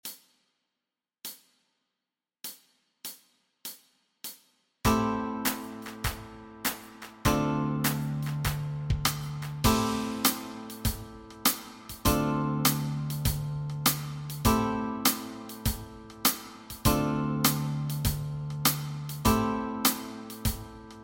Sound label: Backing Track, walking bass